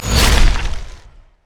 teleport; lissandra skin01

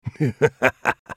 laugh; XenZhao; Character Voices - English; en US

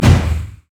basic attack; LoL; morgana; fallenangel

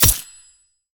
lunge oh; LoL; fiora

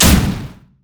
tricleave; riven

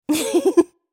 laugh; Tristana; en US

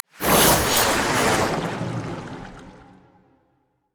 emote laugh; LoL; syndra

